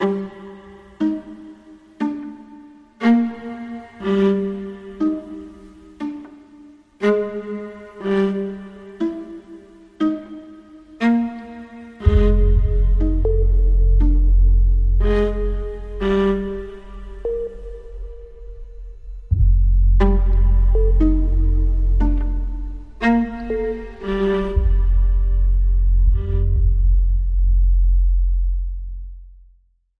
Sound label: IV Notes